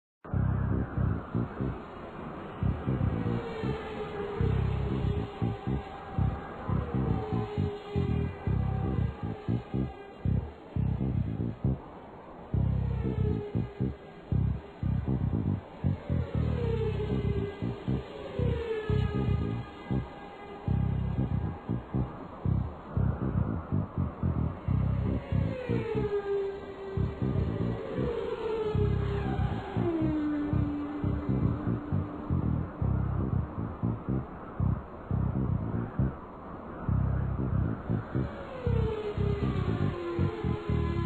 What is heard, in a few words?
Ambience - Raceway; online 2012; angry birds heikki